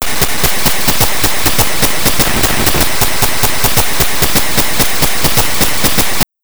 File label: Rhythm